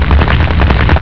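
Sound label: flame loop; uhexen2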